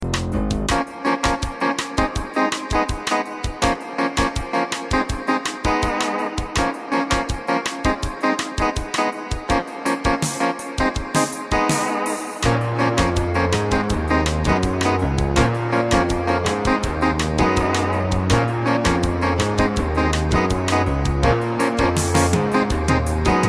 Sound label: jazzy